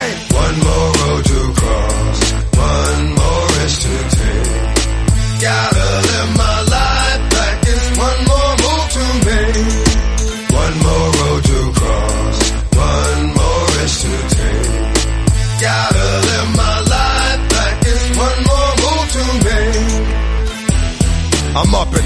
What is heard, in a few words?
remix